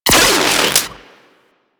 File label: Split Laser Beams Join; Warframe; Corpus